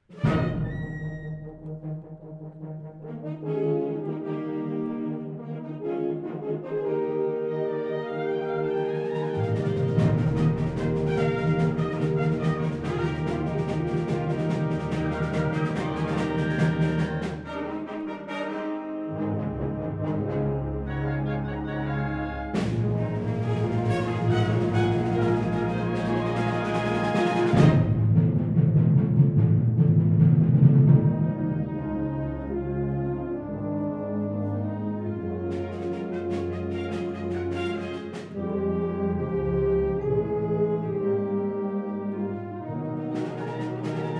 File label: Concert Band